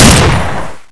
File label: federal fire, weapons